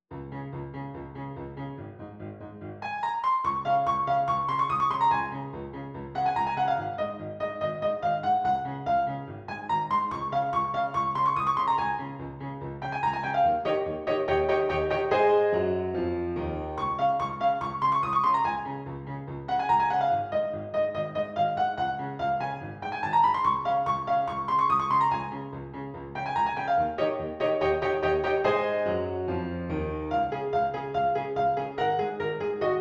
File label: piano; Fantaisie n°5 en ré b M; musique instrumentale